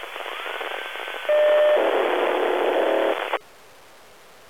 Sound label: pager